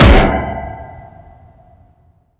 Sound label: metal hit, impact metal